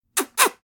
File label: Old man short kisses